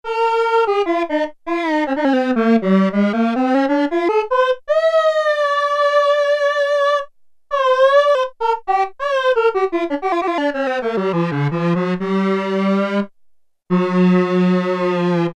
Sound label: SAX, Oberheim OB-8; Factory Patches